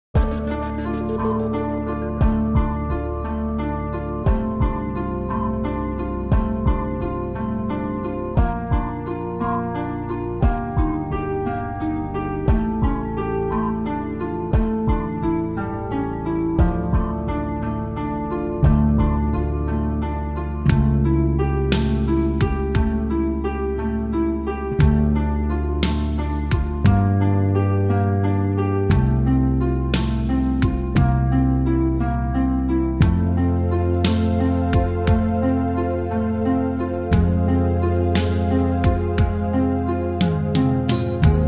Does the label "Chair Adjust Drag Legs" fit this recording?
No